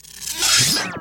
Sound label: Silent shot; Pc Skill; FlyForInsanity